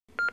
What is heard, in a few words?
lifepak 12 tone d